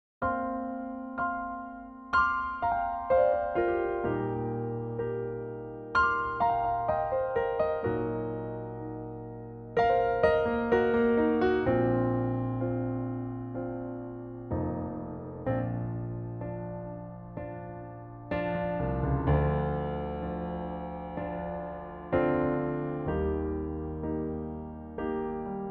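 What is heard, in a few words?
Bb piano, male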